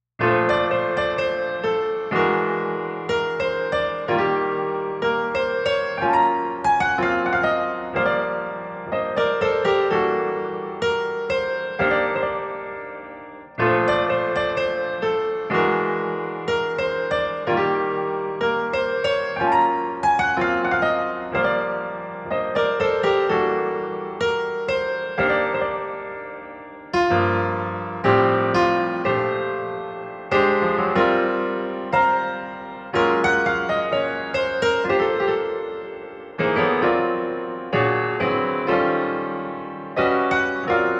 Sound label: Piano Bar Lovely Melody